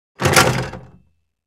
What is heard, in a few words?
Large object drop heavy plastic impact; gfxsounds